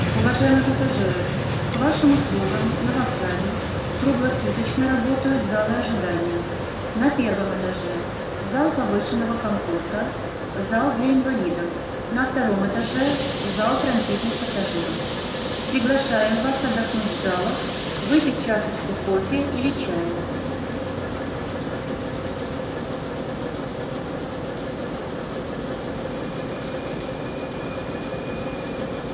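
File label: jd vokzal atmosfera, railway station; odessa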